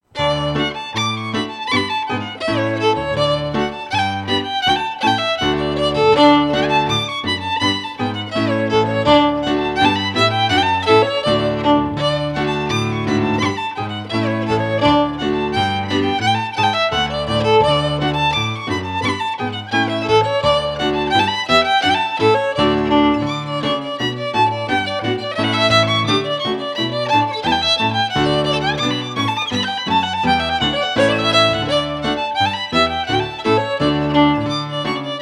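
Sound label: Hornpipe, Scottish Fiddling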